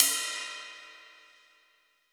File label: Ride Cym IN, Cymbals; Roland BK-5